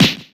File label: slap; Heaven Studio Plus; munchy Monk